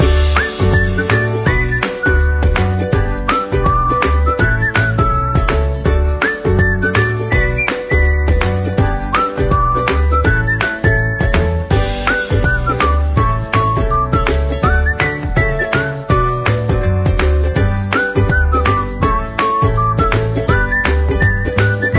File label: Level Theme